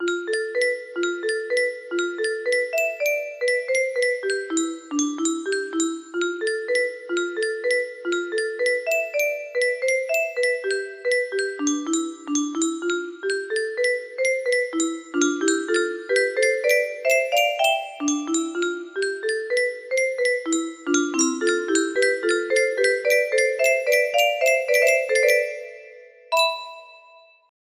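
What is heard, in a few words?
music box melody; lost woods ocarina of time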